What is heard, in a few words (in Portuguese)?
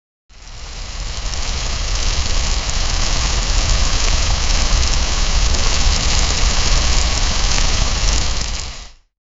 faca um som de lava